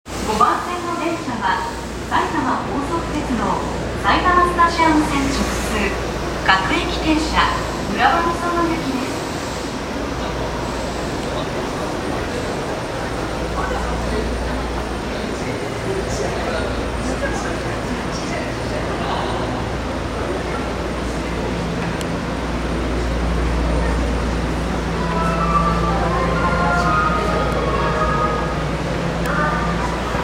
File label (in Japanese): 南北線飯田橋北, train sounds